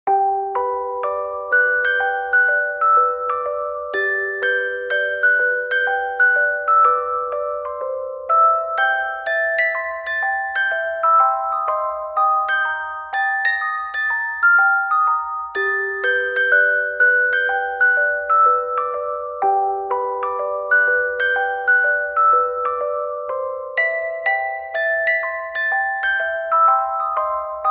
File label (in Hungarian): zenedoboz; adventi